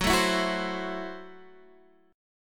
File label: F sharp Minor 7th Flat 5th, Guitar Drop D; chordshape; down